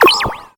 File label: window open